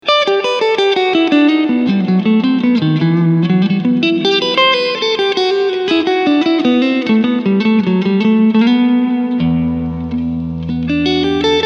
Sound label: Full Reverb